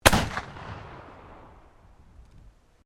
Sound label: MUSKET CAPLOCK SENTRY ARMS FIRING, at a shooting range; Black Powder firearms; Gun Shots